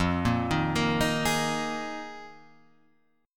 Eb Suspended 2nd Suspended 4th

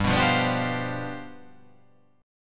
G 11th

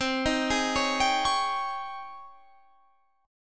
G sharp Major 11th C

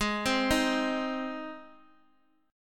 G sharp Major G sharp, chord

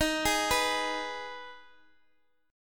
Eb Suspended 4th Sharp 5th Eb Guitar Open D

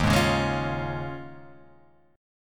D sharp 9th Suspended 4th, Guitar; strummed; JGuitar

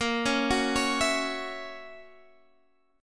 F sharp 7th, guitar

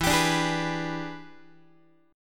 E 7th Sharp 9th Guitar, chord; E7#9 strummed; JGuitar

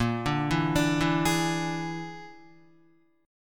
Bb 7th Flat 5th, Guitar; arpeggiated; guitar chord; 5,5,4,7,4,4; JGuitar

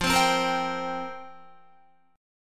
F Suspended 2nd Suspended 4th F down Guitar; Fsus2sus4; strummed

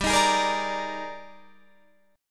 Eb Major 11th G, guitar

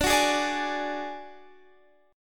D Major Flat 5th D Guitar Standard, chord; strummed; JGuitar

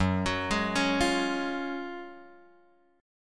F sharp Major 7th Suspended 2nd; F#M7sus2 Chord {2 X 4 1 2 1} arpeggiated

